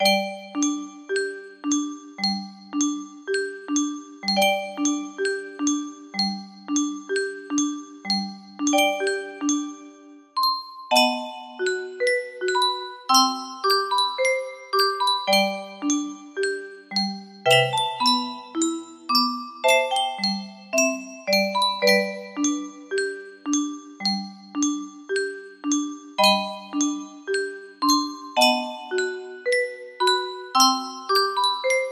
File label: Truce music box melody